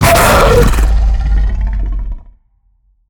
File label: chimera agroed growl; mutants; STALKER; customnpcs